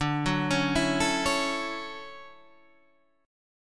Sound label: D-Major 9th D